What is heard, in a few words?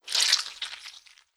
Plague Pesti Spray